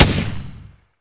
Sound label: Automatic MG3 End, Weapons; DCS